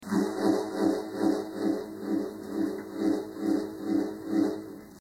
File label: wind wand